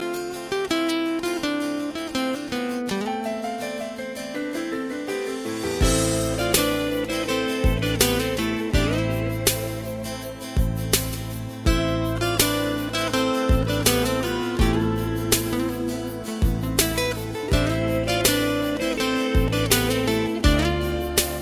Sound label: Romance